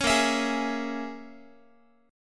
Bb Minor 7th Flat 5th, Guitar; Bbm7b5 strummed

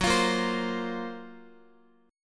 F sharp Major Sharp 11th, Guitar; F#M#11 strummed; x,x,6,10,10,8; 4F,4A,5C,5G,5C,6E; chord shape

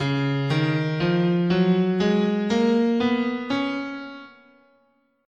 C sharp Mixolydian, guitar, scale

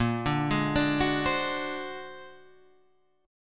A sharp Augmented 9th; A#+9 Chord {6 5 6 7 7 8} arpeggiated

